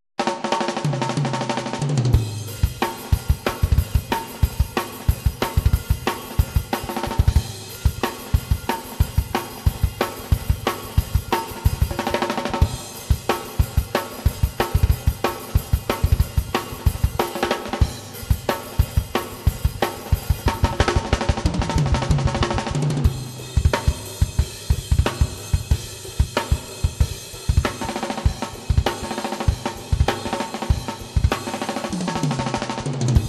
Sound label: drums; multitrack